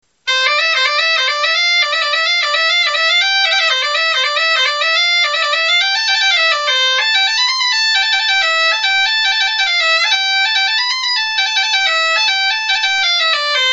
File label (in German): punteira melodie